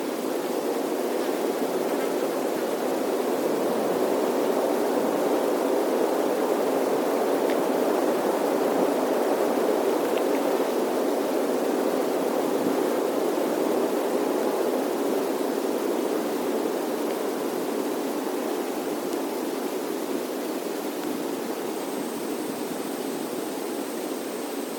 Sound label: Wind Pinetrees